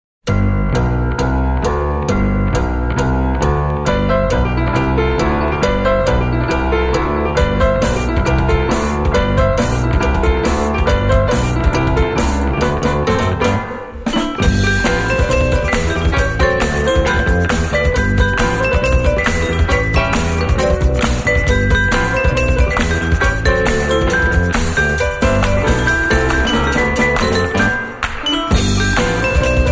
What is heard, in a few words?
Quartet, Soul